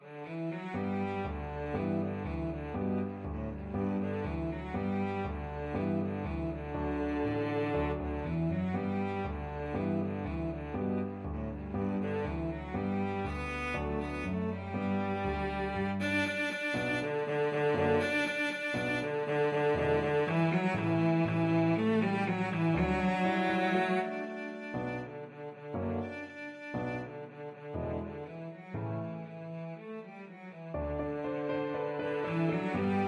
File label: cello